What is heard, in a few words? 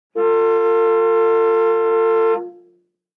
60 Plym Int Car Horn